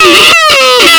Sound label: claw miss